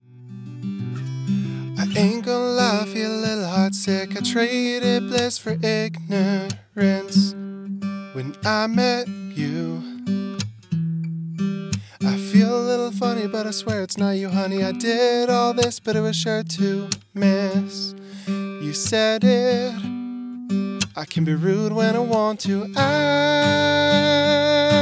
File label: Acoustic